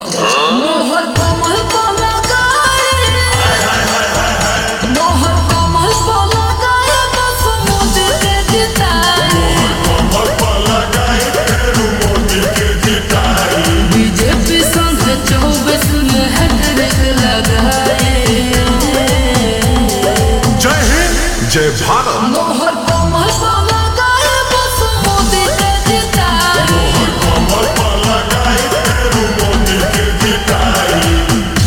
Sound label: Ringtone Slowed Reverb